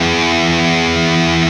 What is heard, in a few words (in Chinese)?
电吉他 G5; 音MAD; 孤独摇滚